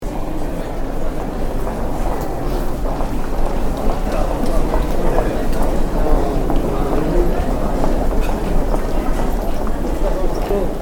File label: station evening